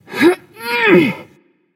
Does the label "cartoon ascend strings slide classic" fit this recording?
No